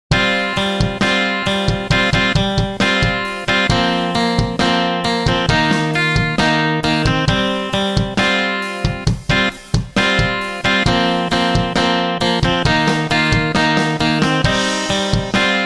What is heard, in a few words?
gtr e; HALion6 : E.Guitar; Tex-Mex Guitar